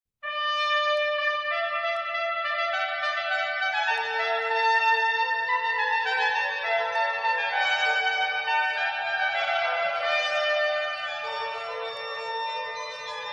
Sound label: Sonata D, organ trumpet; Torelli